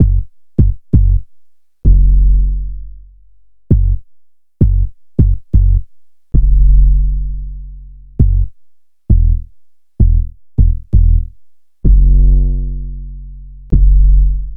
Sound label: Bass High Quantity